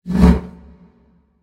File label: swing metal; Smite; Cabrakan